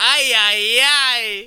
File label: ayayay